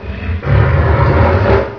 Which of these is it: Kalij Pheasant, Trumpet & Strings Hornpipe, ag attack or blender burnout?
ag attack